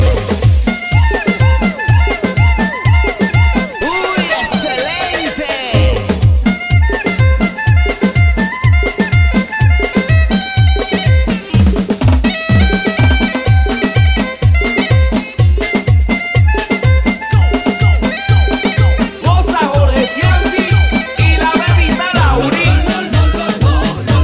Cumbion Africano

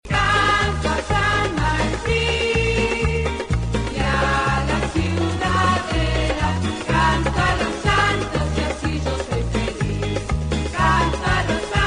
Canto A San Martin

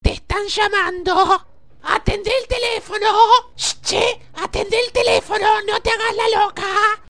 Llamada

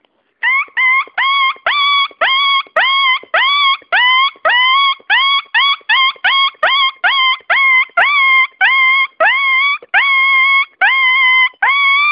Perro Chillon

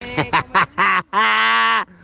Risa

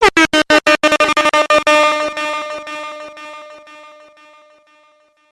trompeta

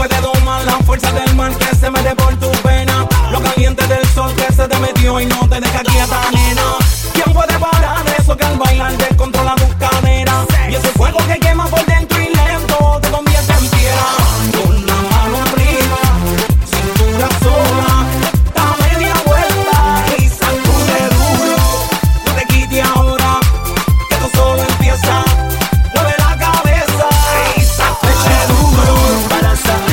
Danza

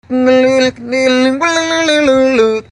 guitarra humana

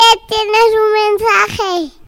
Bebe Mensaje

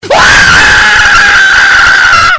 Grito; vegetta777

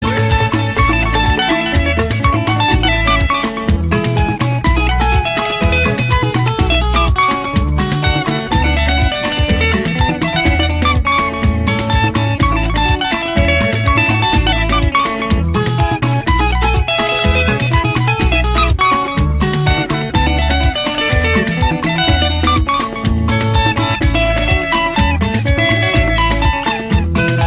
La Guitarrita

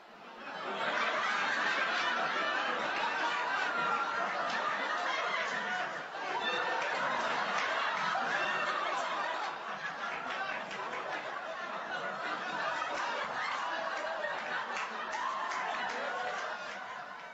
Risas De Fondo

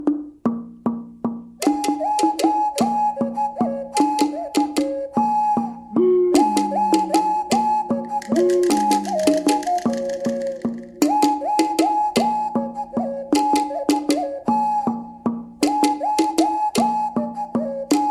Musica Azteca

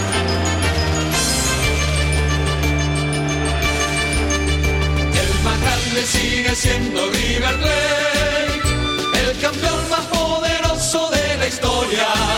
Himno; Himno A River Plate

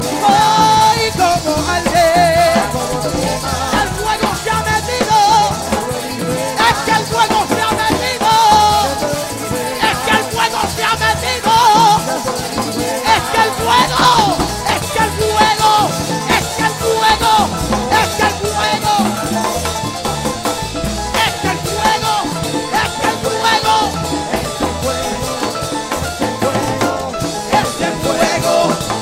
Pentecostal

